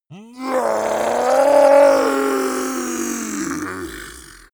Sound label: zombie human change